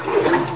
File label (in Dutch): FLOEP; Zoemtonen hisses pingen woesjes tikjes